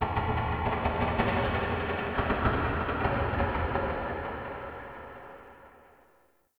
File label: Wooden Clicks; Warframe; Shadowgrapher